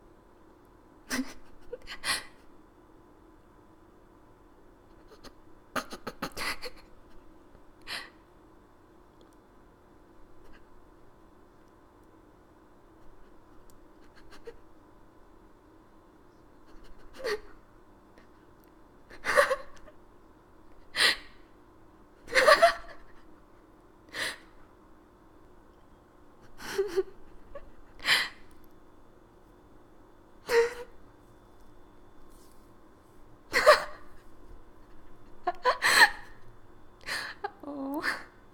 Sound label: Suppressed Laughter